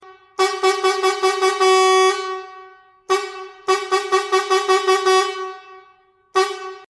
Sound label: horn